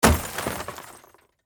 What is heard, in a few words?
Impact Auto Rear, Windshield, Shatter; Automobile; Crash; Crashes; Blast Wave